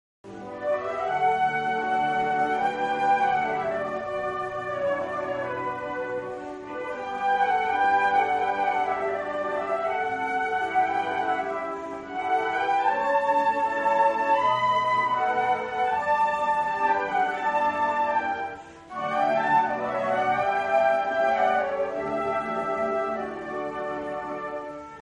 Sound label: concert, flute